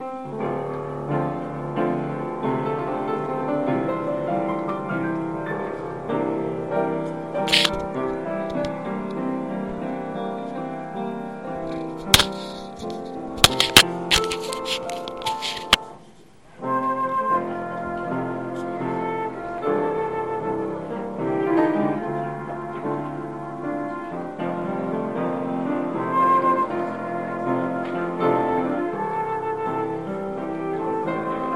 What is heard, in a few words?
Worship Service